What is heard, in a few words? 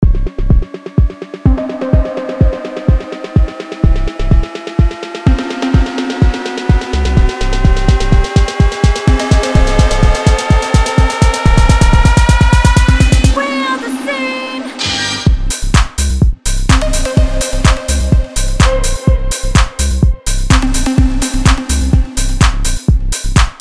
Funky Tech House